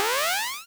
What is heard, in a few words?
throw projectile; Adventures in Lestoria; action RPG game; Sig Productions